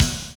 DOUBLE BD; Univers Sons

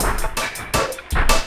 LOOP, 162 BPM, Drum & Bass; Ueberschall; Drum & Bass Resonance

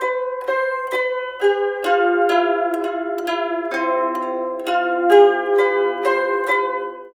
SITUR, SITUR PH; Spectrasonics; Heart of Asia

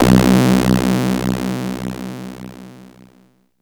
Filtered B, Basses; Drum Bass; Zero-G; Total Drum Bass

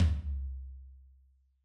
Yamaha DJX 2B, Room Tom

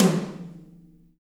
SLAMTMHI; Drums In Your FACE!

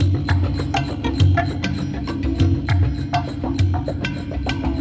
Ambi Groove

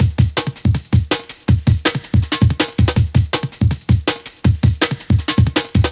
Release, Jungle Breaks; Jungle Warfare 1&2

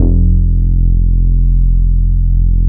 CHUB BASS, Bass Analog & Synth, GARAGE; USB Soundscan vol.12; AKAI

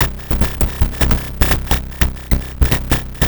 Atonaloop, Industrial Analog

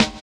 HI RIDER; Spectrasonics; Metamorphosis

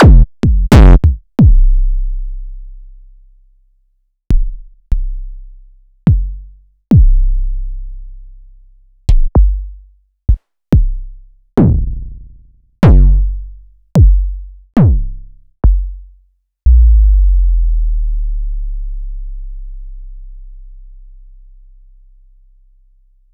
Access Virus B

